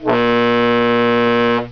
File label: HORN, 汽车; 交通